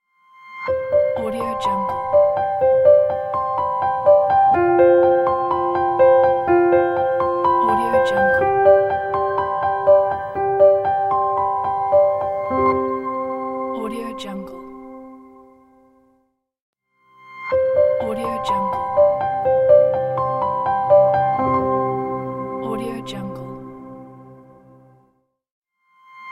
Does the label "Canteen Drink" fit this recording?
No